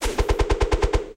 UI Boomerang; BTD6

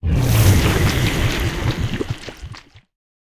Boss Bloonarius Vomit

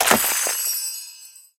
UI Easter Chest

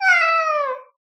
Cat Meow; BTD6; CT Prop

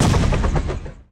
Removable Impact

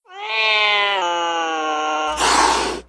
angry cat; ezili

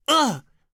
Damage; Quincy

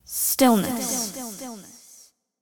Activated Psychic Blast

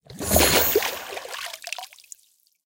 Sulfur Springs Sequence

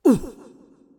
Damage; Adora

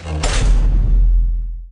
UI Gate Close End

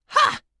hah

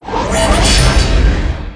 activated rapid fire; tron

